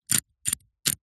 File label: household padlock combination dial turn; zapsplat